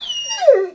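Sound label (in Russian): приветственный визг любящей собаки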